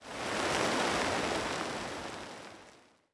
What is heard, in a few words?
impacts; World of Tanks